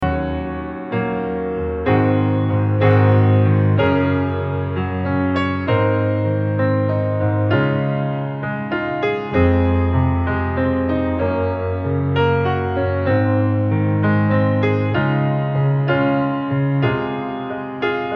solo piano

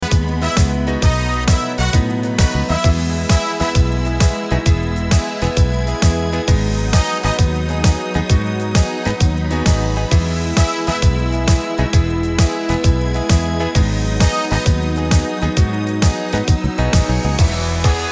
hully gully

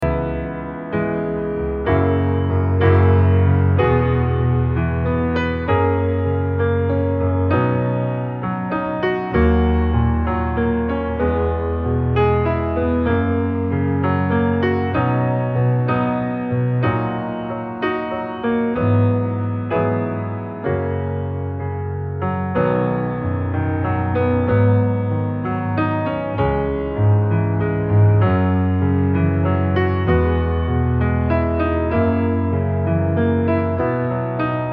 solo piano

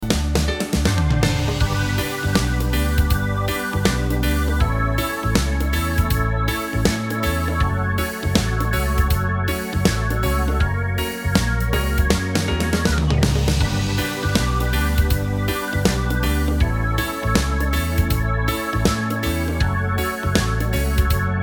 reggae

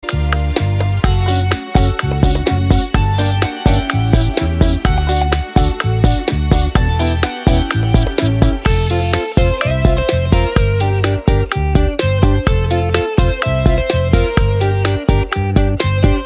bachata

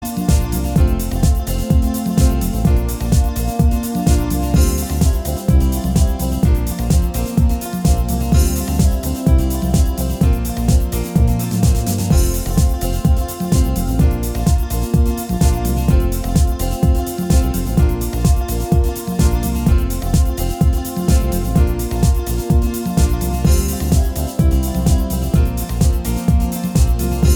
dance

